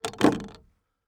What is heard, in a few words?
Digital Button Click; Multimedia Internet CD-Rom Flash